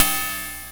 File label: cymb, cymbals